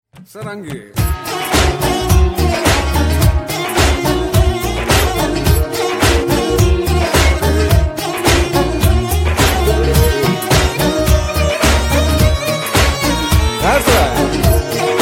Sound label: sarangi ringtone; bollywood ringtones